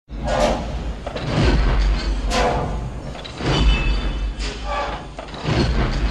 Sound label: walker sound fx; at-at; battle of hoth